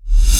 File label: REV, SOUND EFFECT REVERSE